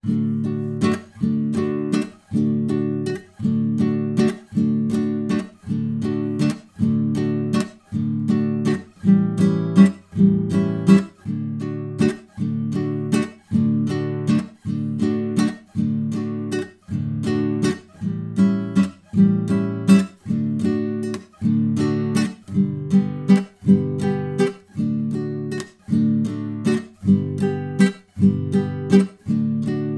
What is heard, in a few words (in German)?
Gitarrenbegleitung